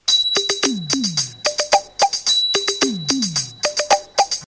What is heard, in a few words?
Instrument Mix